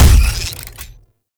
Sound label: Shot Fire; Warframe; Weapons; Afuris Prime